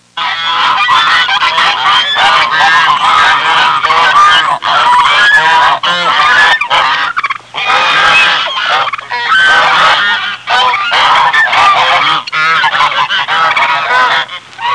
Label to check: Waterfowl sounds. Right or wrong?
Right